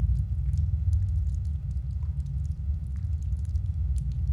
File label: underground cellar, ambience; Soldier Of Fortune II Double Helix; XBOX; finca